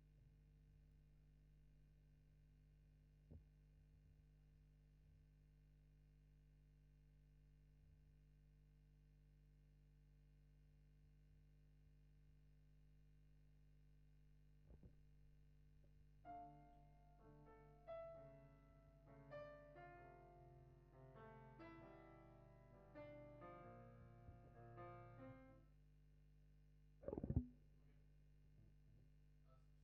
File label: GTR B